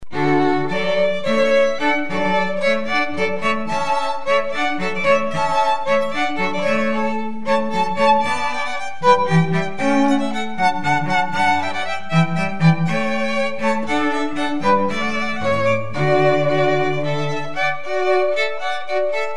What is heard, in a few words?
Hornpipe Strings